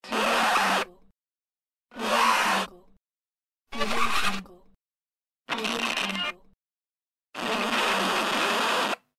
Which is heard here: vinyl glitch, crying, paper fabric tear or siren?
vinyl glitch